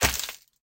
Action Hit; SoundsCrate

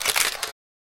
camera shutter classic

